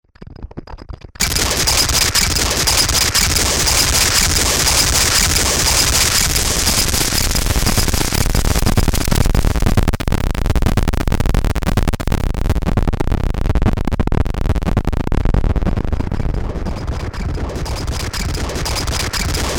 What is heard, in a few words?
Thirty-Three TT-1 noise